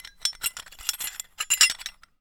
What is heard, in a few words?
Hole Saw Rattle, Hole Saw Bits